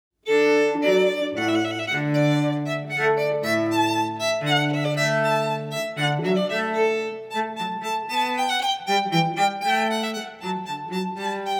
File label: Hornpipe in D